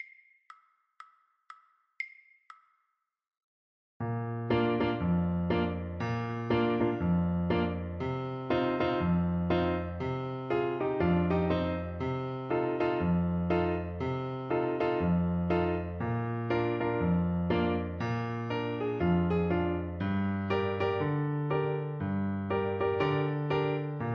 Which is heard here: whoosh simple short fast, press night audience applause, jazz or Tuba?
Tuba